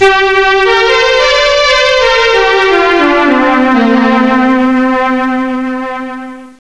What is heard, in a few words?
ORGAN